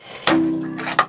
gas down; Cars; XBOX

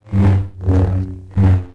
lightsaber attack Human; weapons

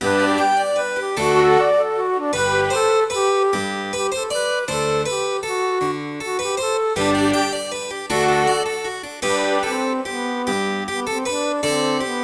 dance normal; Sid Meiers Pirates; XBOX

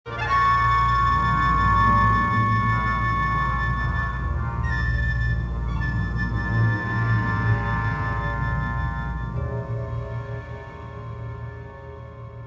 swamp, music; Star Wars Jedi Knight II Jedi Outcast; XBOX; yavin swamp

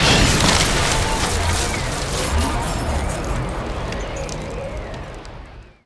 AIR DIE

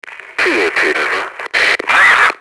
merc noise; enemy; Max Payne

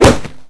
Bullet Body, Impacts

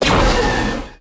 concuss Rifle; weapons

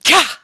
GRUNT, VOICE; Pulse Racer

